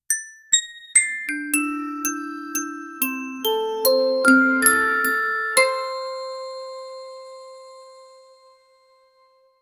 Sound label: super short 08 second pos, success